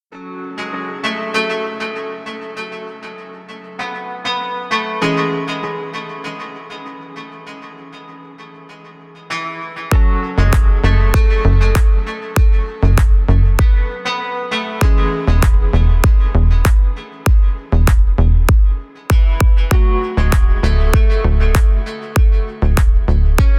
spokojnyj instrumentalnyj rington

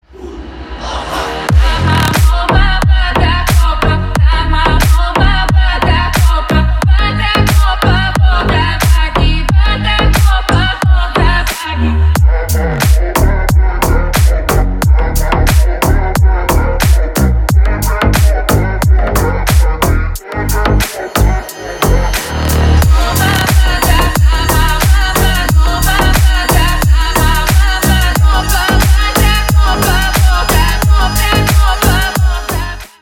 basovaja klubnaja narezka

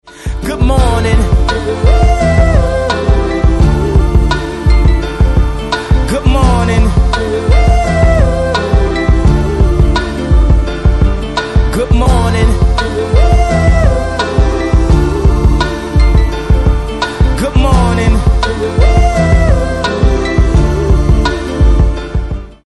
narezka na budilnik

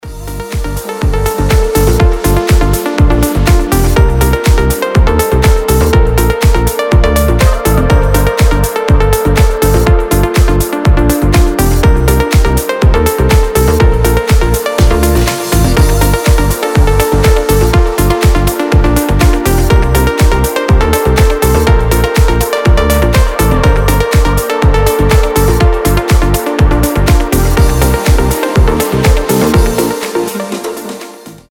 chilovyj dip

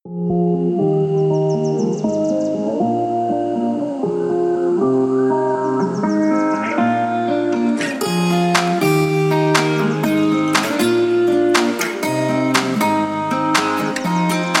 tropicheskij krasivyj budilnik

